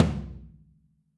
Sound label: FLR TOM MOD; Q Up Arts; Denny Jaeger Private Collection